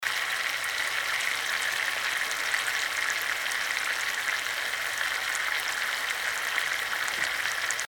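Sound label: sound of frying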